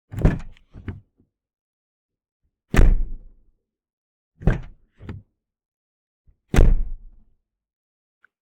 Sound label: Vehicle Caravan Door Opening Closing